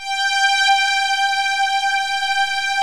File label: MIDI STRINGS